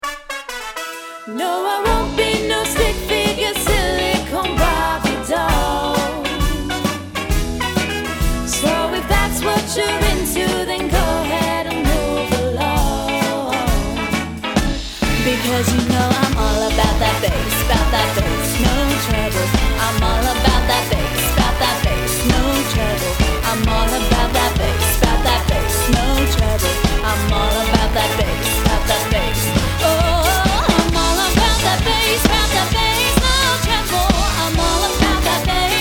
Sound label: Digital Swing